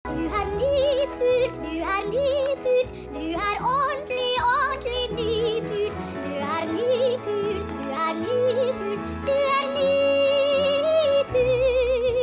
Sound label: ringetone